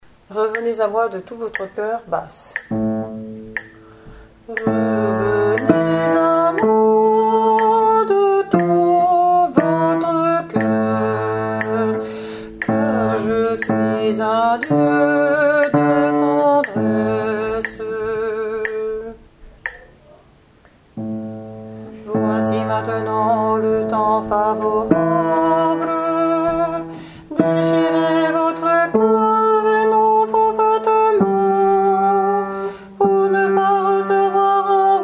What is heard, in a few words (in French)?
Revenez à moi de tout votre coeur BASSE